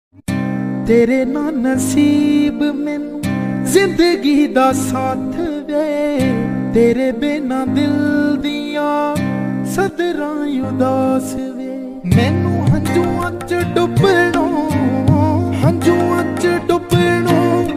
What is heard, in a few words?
panjabi ringtone